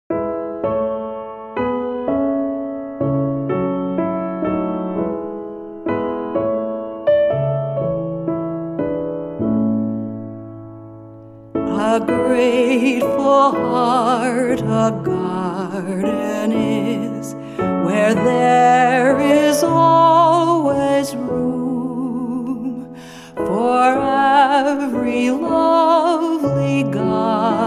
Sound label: christian science hymnal vocalist; vocal piano; A Grateful Heart a Garden Is; Christian Science Hymnal 3